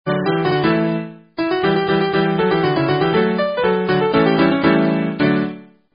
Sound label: Midi